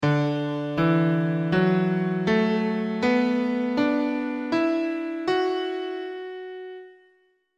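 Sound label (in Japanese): ハピドラム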